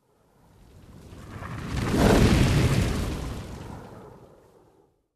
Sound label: Crackling Fire light up whoosh